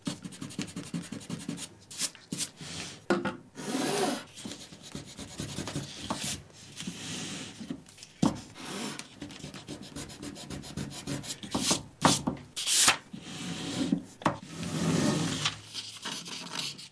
drafting blueprint; Buildalot2

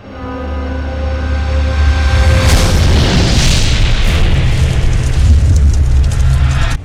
fire monster; Stray Souls Dollhouse Story

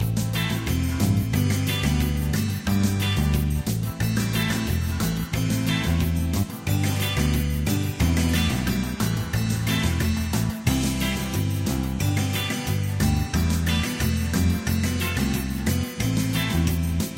music; Rescue Team 3

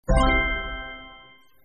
trophy unlocked; Delicious Emilys True Love

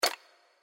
click; New Yankee in King Arthurs Court

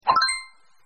Chain bonus extra score; Delicious Emilys True Love

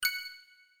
hint; New Yankee in King Arthurs Court

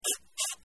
remove item from tray; Delicious Emilys Childhood Memories

